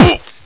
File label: damage; dusk